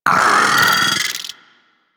Vocal Attack; Warframe; Amalgam Moa

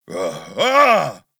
Breaths; Quests; The New War; Skiff Crash; Kahl

